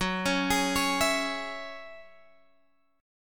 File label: Gb 7th Suspended 2nd Gb Guitar Open G